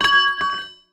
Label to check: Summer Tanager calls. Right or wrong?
Wrong